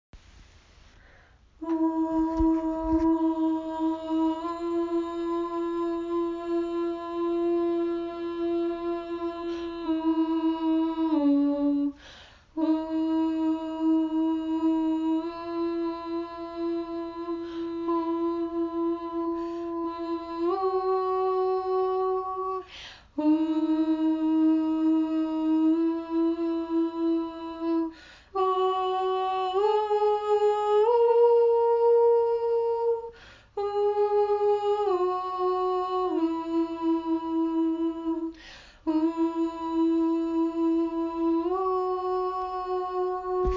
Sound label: Verse Harmonies